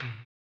HAMBONE; Blues & Soul

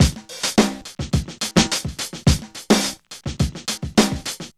SWINGIN 106, Funky Elements loops; Zero-G

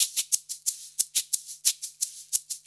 90 SHAKER

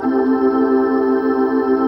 HOUS PAD, House Dance; 90 s Sample CDs; Best Service Dance Mega

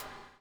BUBL; Spectrasonics - Backbeat

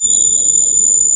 CMI ORGAN; Wizoo - Powered DX

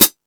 High Hat, Drum Machines

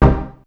Sharp Hit, Yamaha DD-35

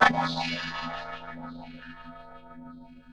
Ring Mod, Cymbal, contact mic; Murf & Ring Mod

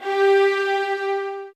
G, soft violins; Q Up Arts; Denny Jaeger Master Violin Library

